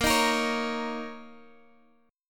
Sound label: A sharp Minor Double Flat 5th, A sharp, down, Guitar, Half Step Down; A#mbb5